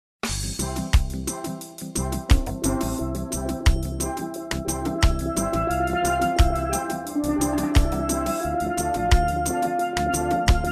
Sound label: Steel Drum